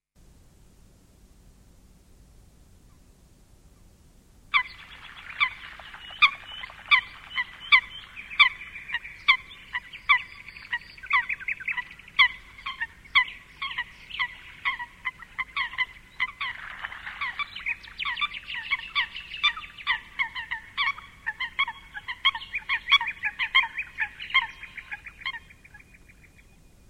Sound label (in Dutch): De Meerkoet